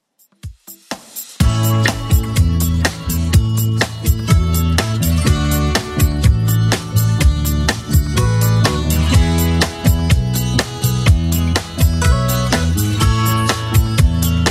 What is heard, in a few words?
70s Redrum 124 bpm